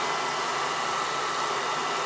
sand loop

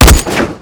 sniper; weapons

